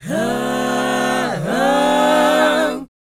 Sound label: BOYZ G, Vocal Planet R&B & Dance; ILIO